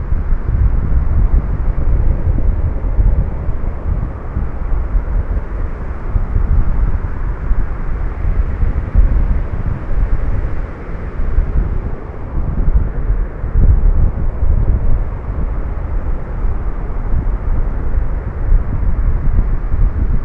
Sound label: Wind